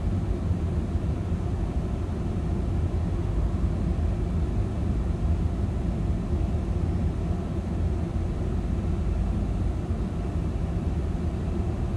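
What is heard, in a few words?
Ambience Servers-Fan Low Loop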